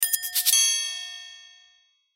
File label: Accent Percussion Triangle Shaker; Hits; Blastwave; Sound Effects:Musical